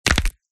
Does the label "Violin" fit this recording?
No